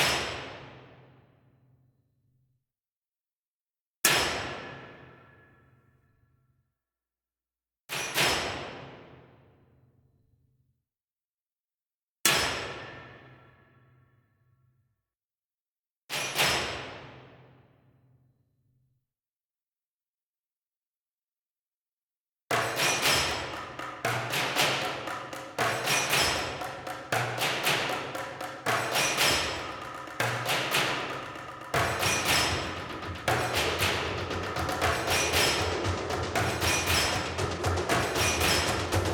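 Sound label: Heavy Blades Metal Perc; Grineer; Grineer One B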